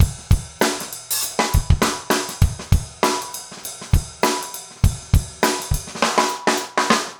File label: basic funk 100